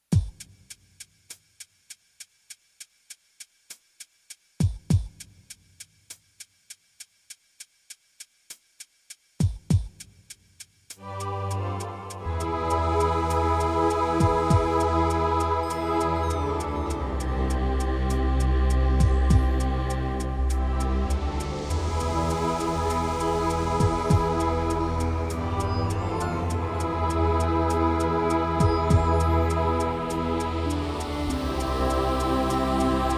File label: Lullaby Instrumental